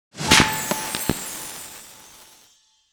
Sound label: explosion small win; netent